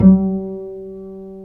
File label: Bass, Roland